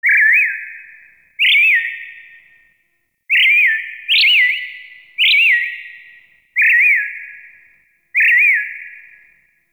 Terrarium Bird Chirp H; Warframe; Ambience; Entrati; IntTerrarium

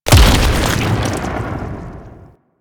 Golem Head Explosion; Warframe

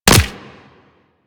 Player Bullet Impact Flesh; Warframe; Weapons

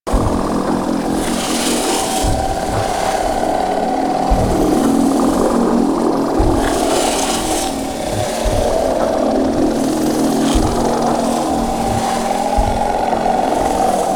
Spinning Stone Machine Loop; Props; Warframe; Ambience; Sentient Devourer

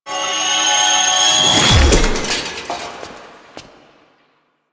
Veil Struggle Finish; Warframe; The New War; Stolen Plates

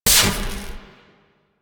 Scrap Container Shutdown; Warframe; Missions; Zariman; Zariman Assassinate

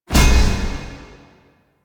Rally Cast Peak; Warframe; Warframes; Hoplite

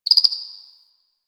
Cricket

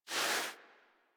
Kubrow Foley Idle Paw Swipe; Enemies; Warframe

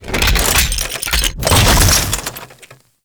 Ground Equip, Heavy Grenade Launcher; Warframe; Archwing; Kuva Ayanga; Weapons

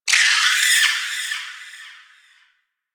Settlement Creature High Mid; Ambience; Warframe; Grineer Settlement

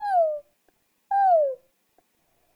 Trapped Sprout Vocal; Characters; Friendly; Sproutfolk; Fey Hub Sprout

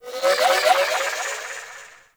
Ship Data Transfer Pulse; Warframe; Corpus Ship Remaster

